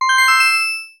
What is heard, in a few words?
chime sparkle